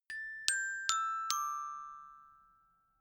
notification glockenspiel dry sequence descend

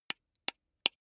soda can tap finger soft; sport freizeit

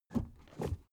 suv idle 1st gear change slow; verkehr transport; autos

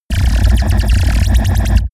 space laser blaster cannon; krieg-waffen; soundpool

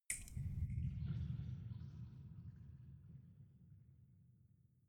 dripping; atmosphaeren

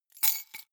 key ring large drop stone soft; objekte; schluessel

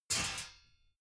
metal sheet crash light; metall

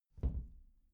glass door close soft

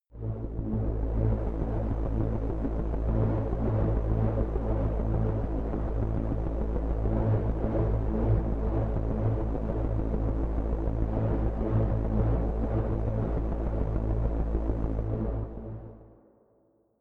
drone hovering; musikelemente

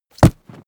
body fall generic mid tumble; krieg-waffen; nahkampf; soundpool

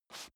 step boots metal dry slide short; schritte; Footsteps

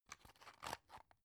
drug close hard; berufe; arzt

